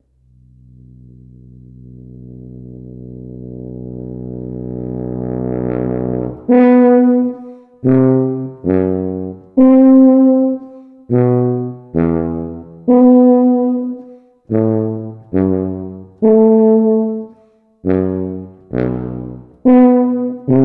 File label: impromptu tuba